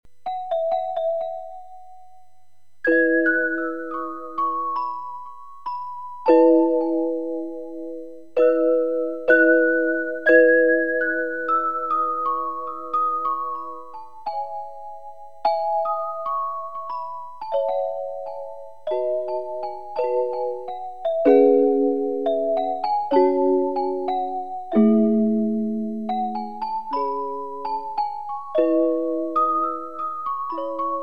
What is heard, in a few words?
celesta